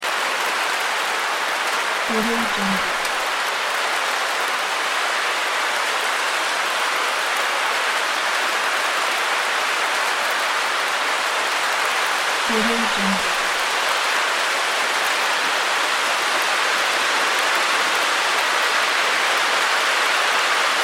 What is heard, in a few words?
white noise rain loop